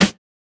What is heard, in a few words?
Snare Hit; big fish audio; Hip Hop Exotica